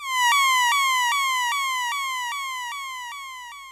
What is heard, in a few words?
synth seagull